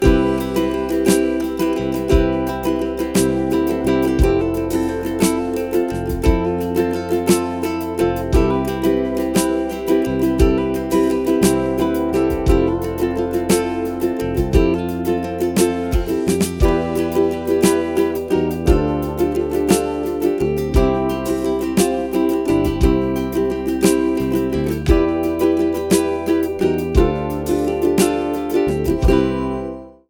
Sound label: Lazy Uke Day, no melody